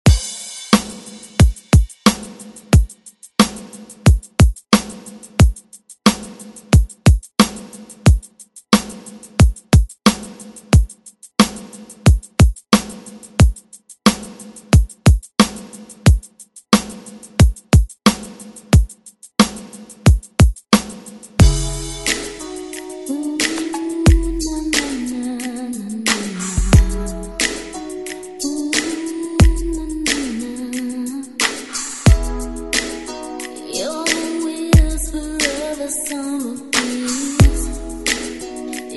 90s ReDrum; DJ Mhark

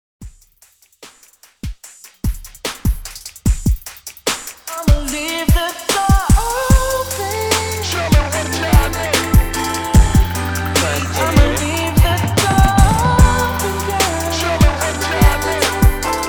74 BPM

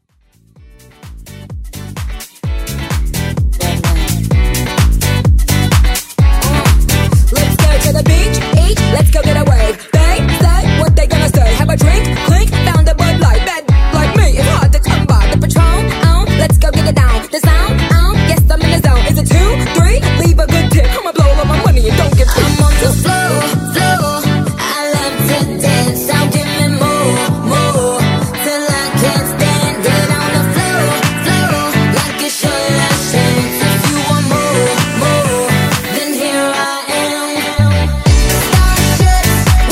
House Reffix

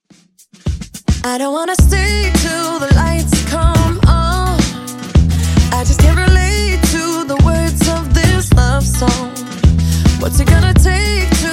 107 bpm; Dj Intro Outro

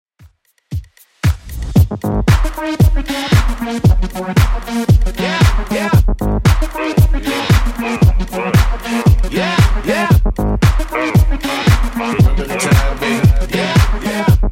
2ks DJ Friendly Redrum 115bpm

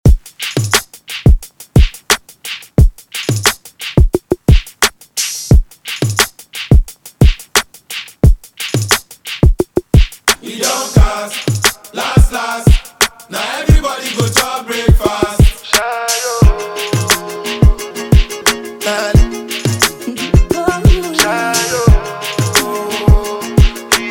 Afro Redrum 88 Bpm; remixplanet

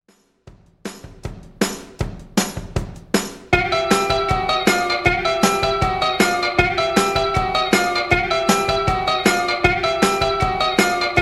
157 Bpm; Dj Intro Outro Clean

